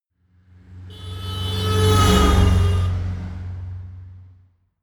notification honk, autos; verkehr transport

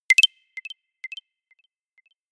notification pager; kommunikation; signale

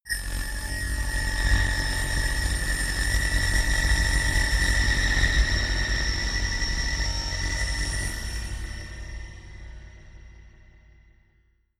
danger rumble, horror

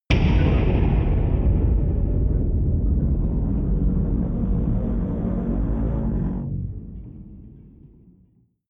drum wobble; percussion

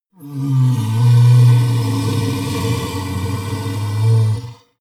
monster inhale low; kreaturen

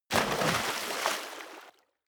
body fall water deep; krieg-waffen; nahkampf; soundpool

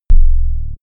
dark impact; hits explosionen

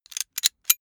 metal clicks; industrie wirtschaft; metall

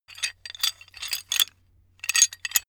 metal handling pieces

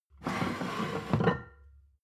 plate stack slide table, gastronomie; berufe; soundpool

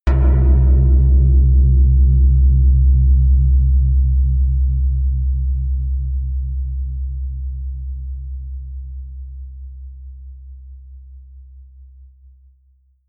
melodic odd pan bell; toene

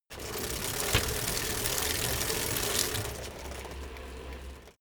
bicycle rickety pedal roll short

